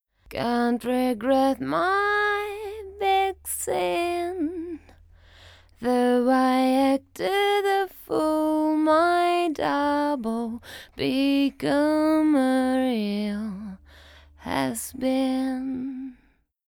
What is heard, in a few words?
solo vocal